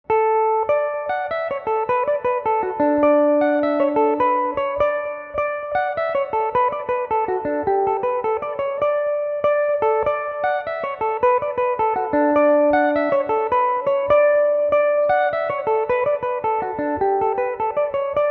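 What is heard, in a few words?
D major, Irish mandolin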